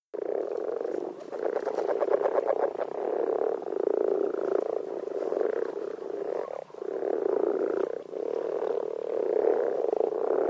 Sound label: Common frog; AMPHIBIANS IN FRANCE